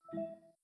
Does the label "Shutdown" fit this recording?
Yes